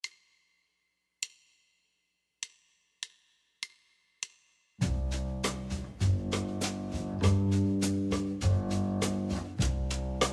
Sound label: backing track